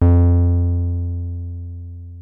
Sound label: 303 D#3, TB 303